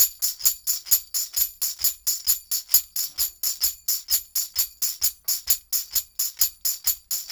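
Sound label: TAMB, tambourine; Drumdrops In Dub VOL-1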